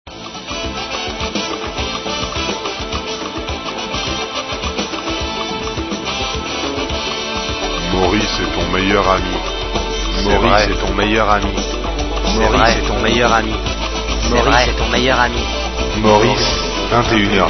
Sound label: jingle